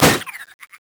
jack in the box; jester

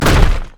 basicattack; LoL; trundle

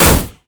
mage chains; LoL; ironforge xerath

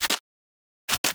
emote laugh; quinn

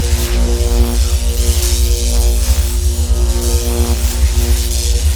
mace of spades loop; LoL; mordekaiser

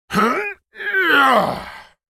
spell cast effort; Darius; Character Voices - English

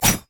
crit attack; riven

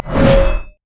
thundering blow; LoL; jayce

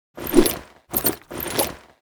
emote dance; LoL; jinx base

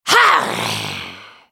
spellcast effort; Cassiopeia; Character Voices - English

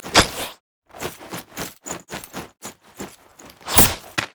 emote laugh; Viktor

